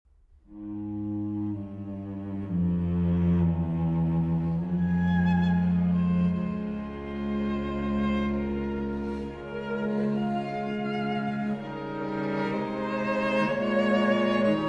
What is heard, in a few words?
String Quartet in F Minor, Adagio